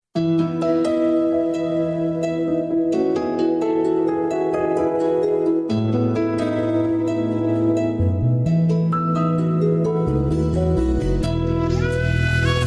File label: Lullaby Song